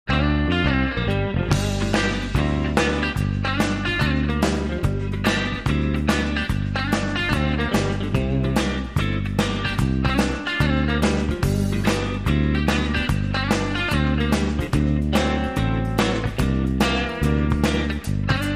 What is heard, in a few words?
Sax